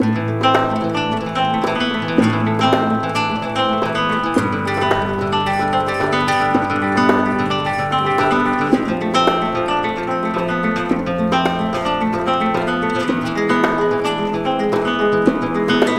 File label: Spanish Strings